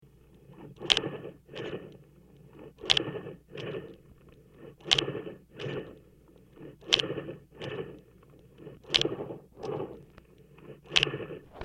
Heart Simulator; On, Run Steady In / Out Breathing With Light Bellow Inflate / Deflate; heartbeats